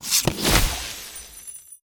Reward

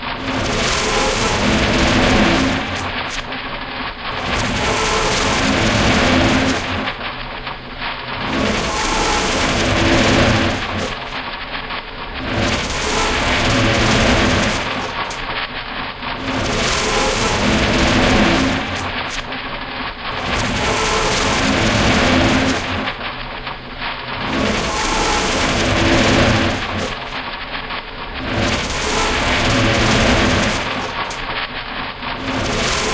Music Loop; Infinite Hole; Music Loop 4

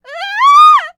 Enemy Generic Human Death Eaten

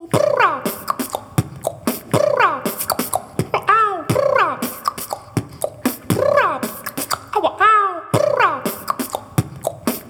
beatbox

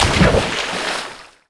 swimming splash body; MiniGames

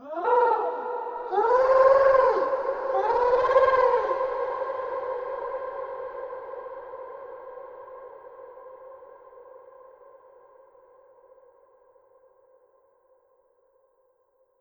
Animals

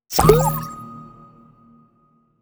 Double Jump Token

